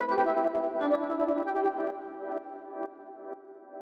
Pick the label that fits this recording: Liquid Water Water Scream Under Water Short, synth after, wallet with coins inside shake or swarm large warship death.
synth after